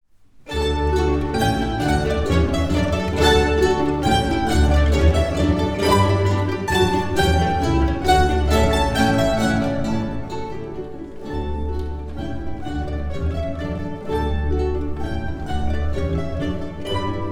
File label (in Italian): Sinfonia in Re Maggiore, mandolines; Gioachino COCCHI